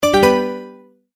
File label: multimedia tone, Goodbye; Sound Design; McKinney Sound